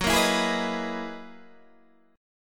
F sharp 13th F sharp down Guitar DADGAD

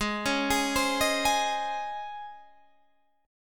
Db Major 7th Suspended 2nd Ab

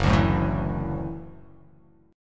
Gb Minor 7th Sharp 5th Gb; strummed; JGuitar

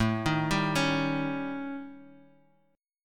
A 7th Flat 5th A, Guitar Drop D; chord

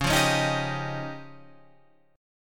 F 7th Suspended 2nd Sharp 5th C sharp

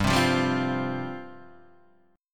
C Suspended 4th G

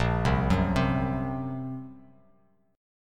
Bb Suspended 2nd Sharp 5th; Bbsus2#5; arpeggiated; 6,3,4,5; JGuitar

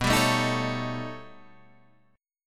B Augmented 9th B, chord

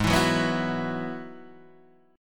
Ab Minor 7th Sharp 5th Ab, down, Guitar, DADGAD

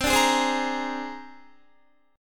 C 7th Suspended 2nd Sharp 5th, C, down, Guitar; C7sus2#5; strummed; 4E,4A,5D,5G,5C,6F

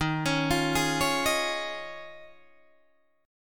Eb 9th Suspended 4th Eb, Guitar DADGAD; Eb9sus4; arpeggiated

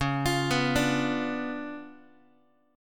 C sharp 7th Flat 9th, Guitar; arpeggiated; chord; x,9,8,9,6,x

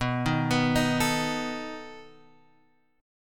B 7th B down Guitar Open G

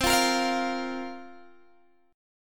C 7th Suspended 4th, Guitar, Open G

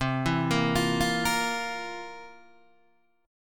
C 11th, C, down, Guitar, DADGAD

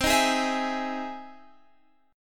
E Augmented Major 7th C

